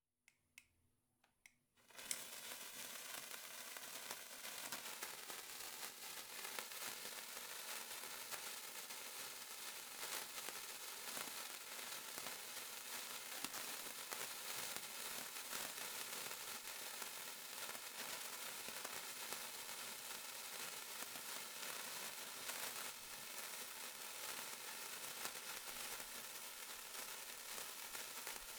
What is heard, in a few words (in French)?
BOUGIE MAGIQUE BRULE ETINCELLES CREPITEMENTS